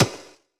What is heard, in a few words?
step; Tap Troupe